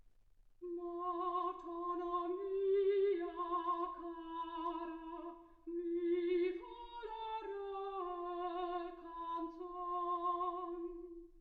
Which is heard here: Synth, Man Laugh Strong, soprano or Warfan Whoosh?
soprano